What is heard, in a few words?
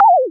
Cry